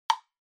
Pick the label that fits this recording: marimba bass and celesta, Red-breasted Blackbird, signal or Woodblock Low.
Woodblock Low